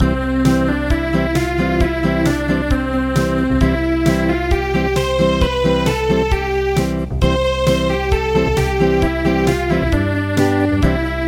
Acoustic

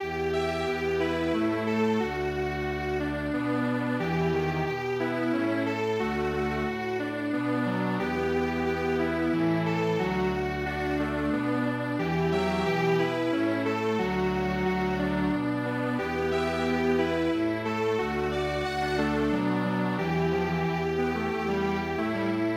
Strings Quartet; Bye Baby Bunting

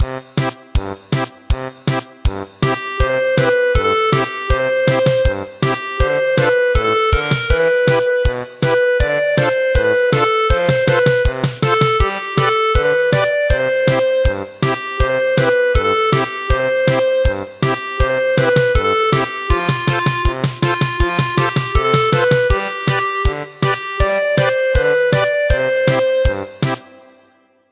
German Polka; The wooden clogs

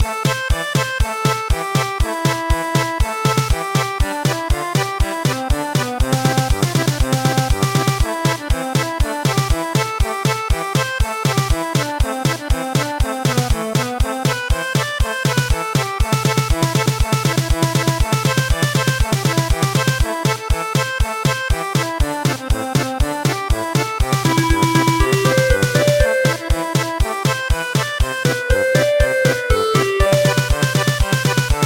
German Polka; traditional

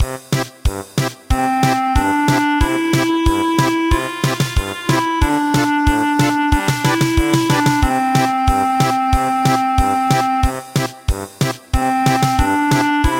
German Polka; Esa Mokan

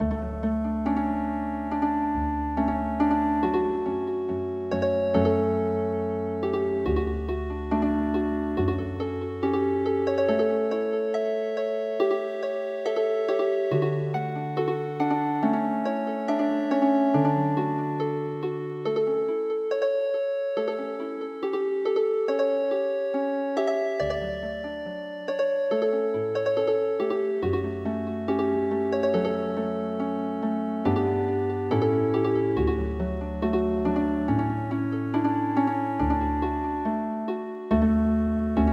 Healing Harp; Arminginuuta

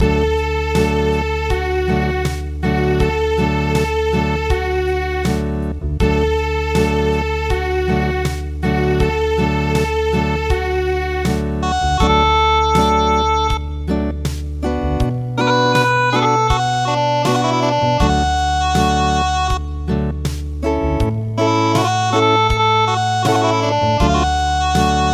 Acoustic; A Sing Sing So

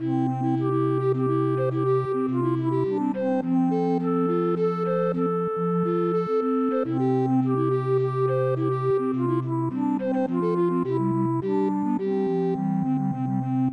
Recorder Quartet; Lavenders Blue

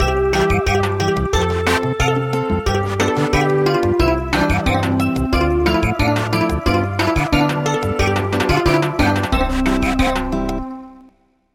Samba; Goodnight Song for Dobbin

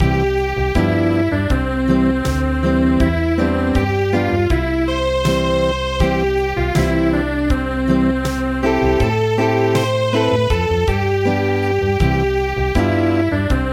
Acoustic

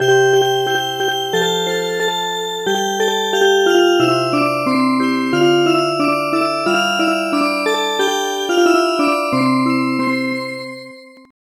Music Box; Goodnight Song for Dobbin

